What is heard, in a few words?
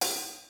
half hat